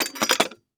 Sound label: Stand C Stand Setting Down On Concrete; AdobeCC